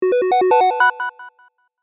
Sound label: classic game action positive